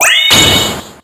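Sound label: Battle Skill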